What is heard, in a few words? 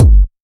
focused kick sound g key